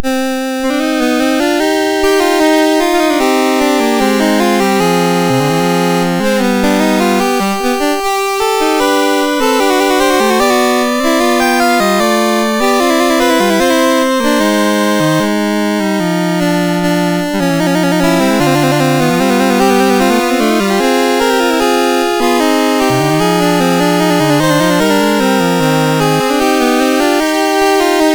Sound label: Canon a 2 per Augmentationen Contrario Motu, MOS6581R2